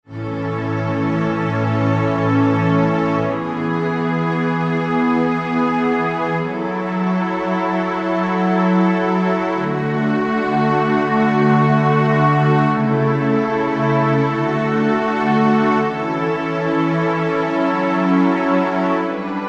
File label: Underscore PAD; Worship Flow